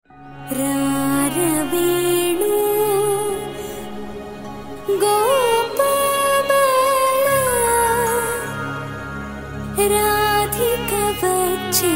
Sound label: Telugu Song Ringtone